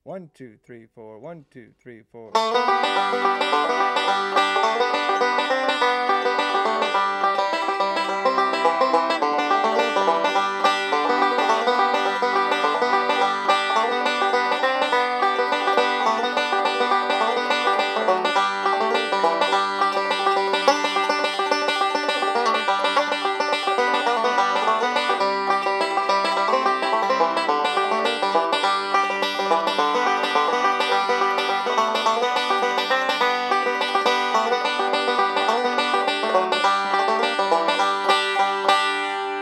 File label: Ill Fly Away Up tempo, banjo